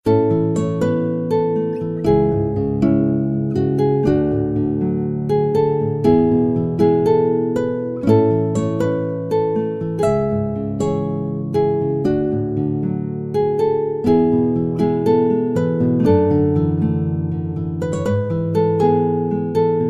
romantic guitar

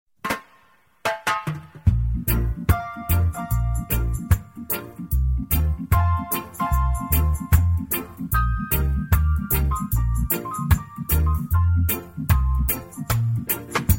reggi

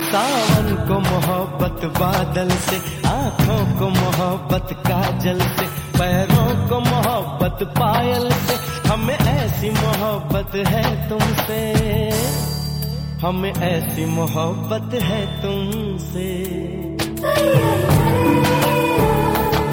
romantic

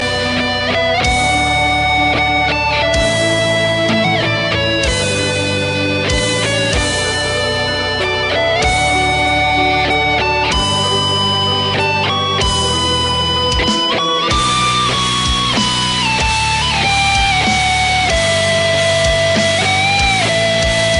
rock; skyrim

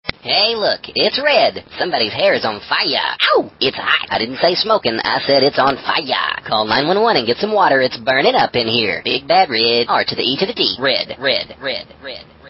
ringtone

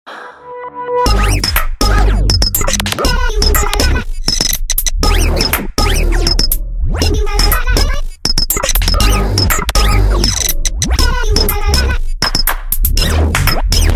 ahh glitch; nokia lumia

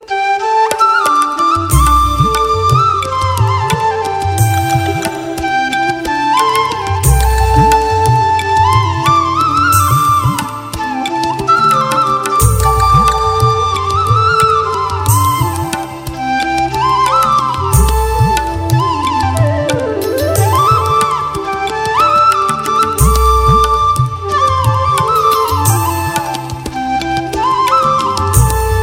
indian flute

